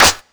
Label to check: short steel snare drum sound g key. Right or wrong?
Right